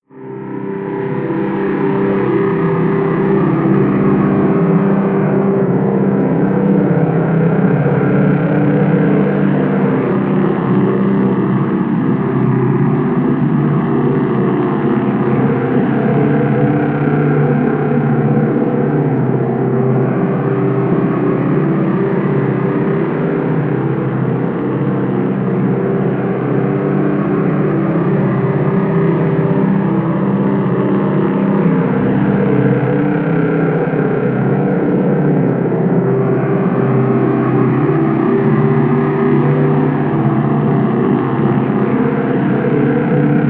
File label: drone talking growl